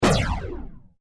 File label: Science Fiction Laser Gun Single Shot; Lasers